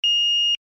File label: alarm tone, sounder alarm tone; sounder beacon